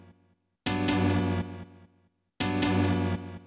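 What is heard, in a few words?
falling closed hi-hat, techno 138 bpm; Best Service ProSamples vol.54; AKAI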